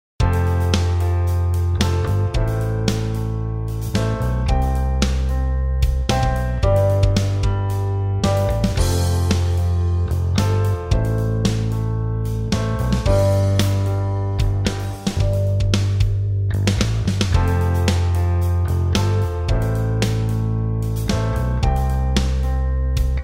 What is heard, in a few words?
chord inversion no inversion